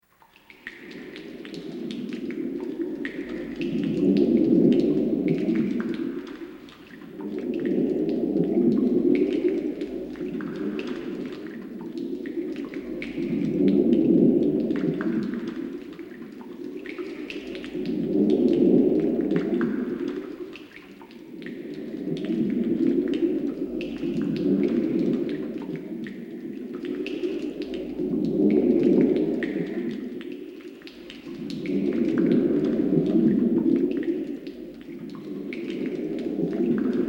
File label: Underwater Drips Ambience; Star Fox Adventures; GC gamerip